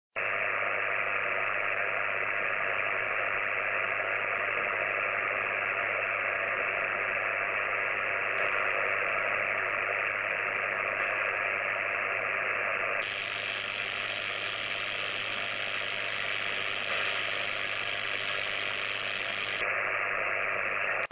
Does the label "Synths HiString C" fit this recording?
No